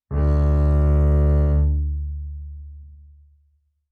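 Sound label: Bass arco fortissimo sul A D2, Double Bass; MIS Pitches - 2014; Strings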